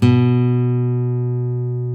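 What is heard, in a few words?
B1 classical guitar; InVision Interactive; Lightware VOL-1; Instruments & Percussions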